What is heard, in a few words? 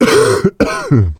Male Cough; blastmorph pinball; xbox series xs